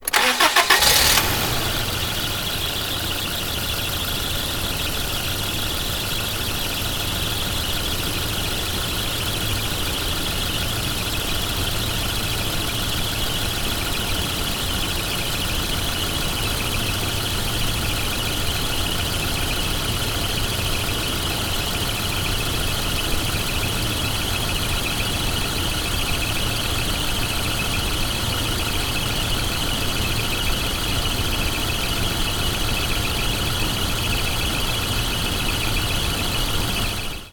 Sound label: starting up car engine